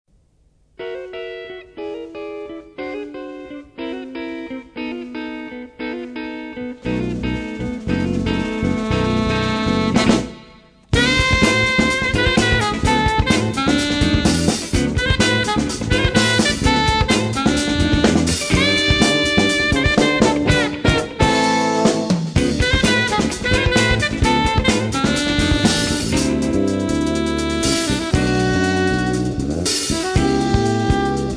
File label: Train Blues; Alausi